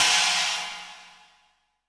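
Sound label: china, JV-1080 drums; EdgeSounds; Drum Mashines VOL-1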